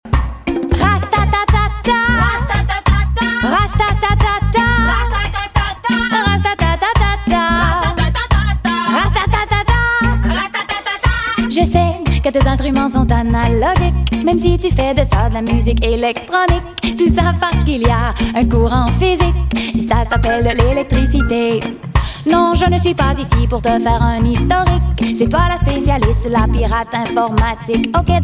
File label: reggae